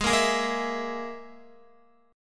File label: Ab Suspended 2nd Sharp 5th Ab down Guitar; strummed; JGuitar